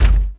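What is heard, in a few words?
droid bodyfall lg metal; droids robots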